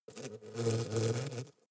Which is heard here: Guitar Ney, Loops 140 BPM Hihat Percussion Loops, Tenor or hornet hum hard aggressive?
hornet hum hard aggressive